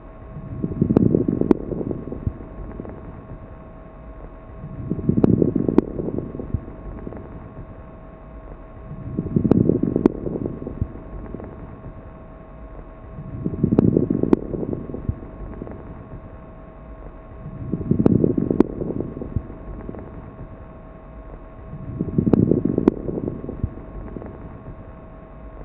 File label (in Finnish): karkeajakoinen rahina, hengitysäänet; ääninäytteitä auskultaatiolöydöksistä